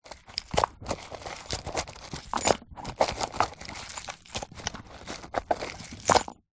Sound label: household jewelry box rummage; zapsplat